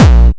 kick

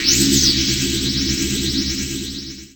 SPACE-FX

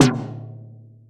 RESO TOM, DRUM KIT; Drum Kit1

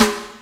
TIMBALE S, REGGAE; Pro-Rec - Synthsonic; REGGAE+ETHNC

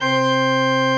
Church Organ C 1; Best Service; Advanced Media Trax Modern Composer

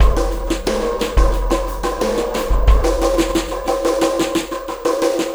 Ripple Perc; Sonic Foundry (Sony Creative Software); Processed Drumkits Zero Gravity Beats

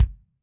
KICK, Drums Percussion; Kick 1

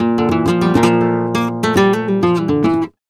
Flamenco Guitar Remates De Pulgar, a

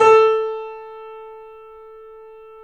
A -L, The Piano; InVision Interactive - The Piano

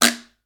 guiro low hi, percussion; ILIO; Synclavier Percussion 2; World & Orchestral

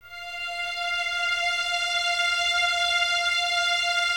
F 5, String Ensembles Violins; Miroslav Vitous; 11 Violins

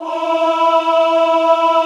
OHH E3, Classical Choir; Best Service; Extended Classical Choir

